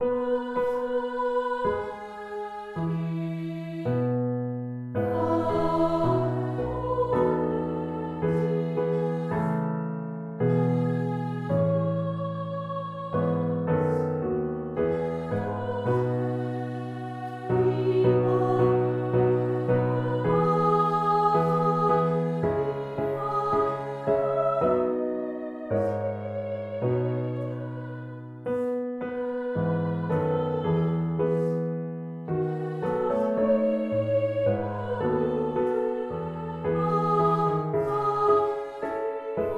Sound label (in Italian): Opferlied Canto votivo; su testo di Friedrich von Matthisson; Prima versione originaria